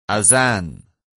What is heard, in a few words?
azan